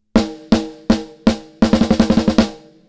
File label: snare; SSL X Gate